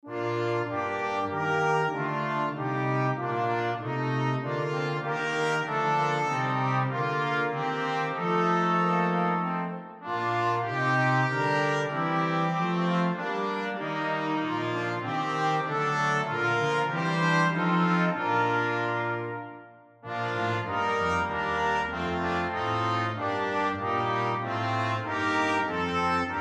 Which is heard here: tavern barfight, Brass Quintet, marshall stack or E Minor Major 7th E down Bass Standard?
Brass Quintet